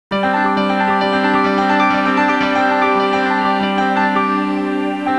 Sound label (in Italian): musica newage